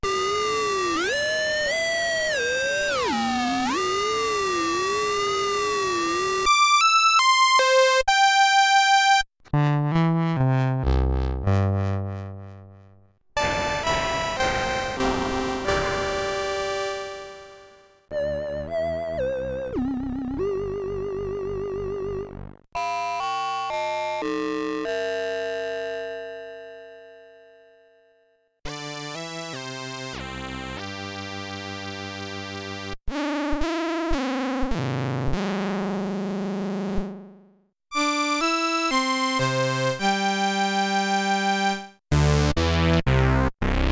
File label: co moog